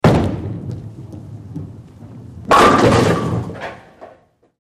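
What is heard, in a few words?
Bowling Ball Down Lane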